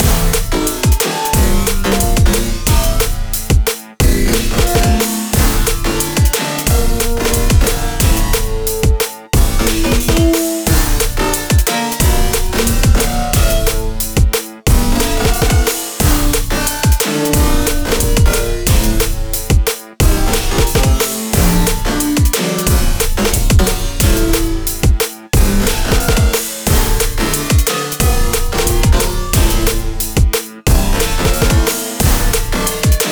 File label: action music